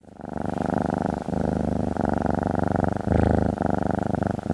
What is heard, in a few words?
cat purring